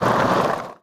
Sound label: skateboard land roll; smite; osiris